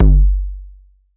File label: Kick TR-808 Drive; 808 Day